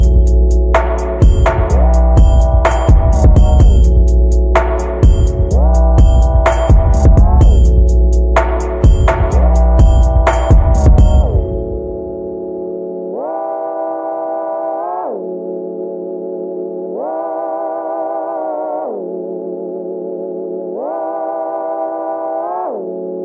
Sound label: New Age